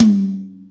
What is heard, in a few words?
Yamaha EX-5